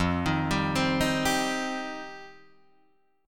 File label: F 7th Suspended 2nd F Guitar DADGAD; F7sus2; arpeggiated; 3,6,5,5,6,5